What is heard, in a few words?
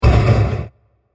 Bursting Metal Scratch Impact; Impacts; Crafted Signals